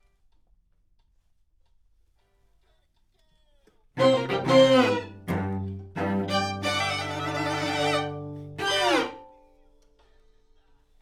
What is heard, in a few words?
Alt, piano